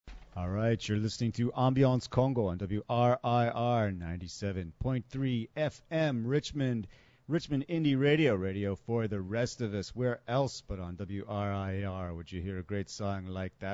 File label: Ambiance Congo